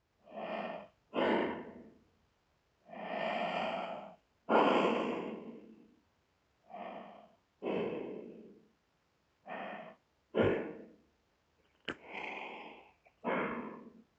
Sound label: Gas Mask